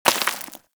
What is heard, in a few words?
Bullet Impact Debris Rock; Warframe; Weapons